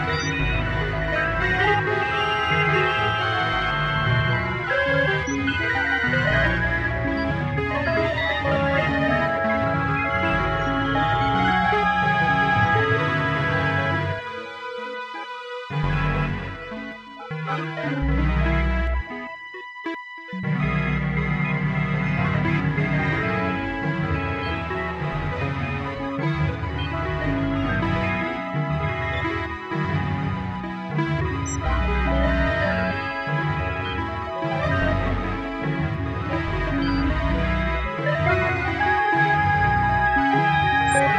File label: midi